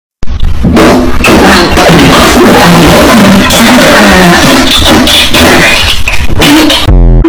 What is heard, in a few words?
man farting